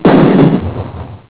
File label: damage